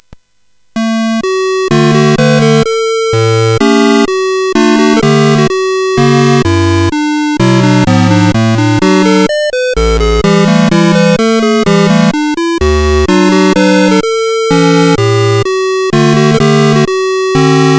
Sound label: Valse, CSG8580